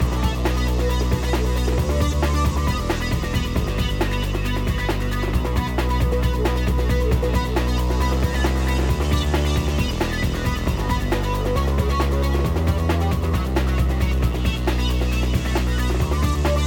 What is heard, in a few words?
arpstorm